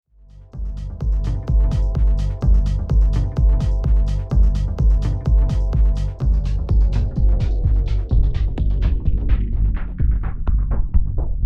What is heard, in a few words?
Pioneer DJS 1000 Pitch